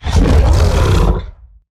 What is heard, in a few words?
Stealth Attk; Evolve; Behemoth